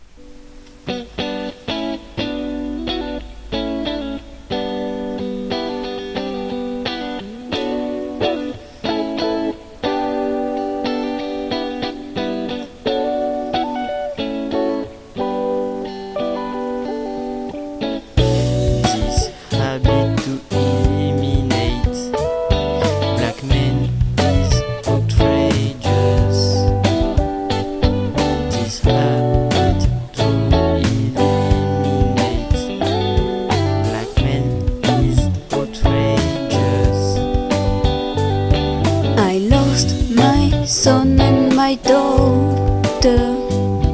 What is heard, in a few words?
blues